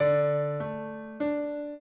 trio, piano; Mozart

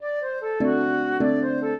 trio, flute harp; Mozart

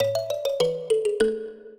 minuet, mbira; Mozart

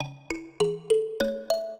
minuet, mbira; Mozart

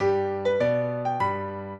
piano; Mozart; minuet

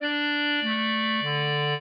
clarinet; Mozart

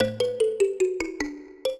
trio, mbira; Mozart

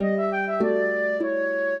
trio, flute harp; Mozart

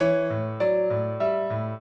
piano; Mozart

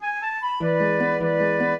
minuet, flute harp; Mozart

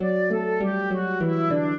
minuet, flute harp; Mozart

